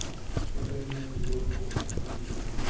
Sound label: laughing